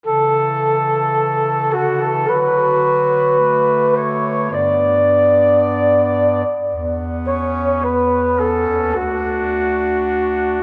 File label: Orchestral Theme Mellow